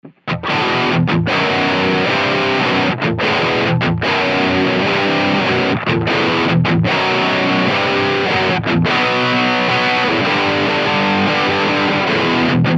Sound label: Drive; Purple Hemp